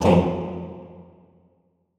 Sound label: TONG F1, Extended Classical Choir; Best Service; SHORT TONG